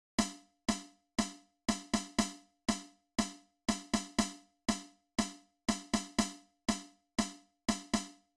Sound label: haas 1ms